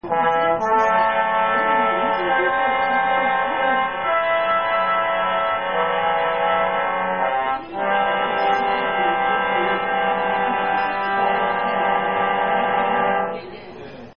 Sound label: fanfare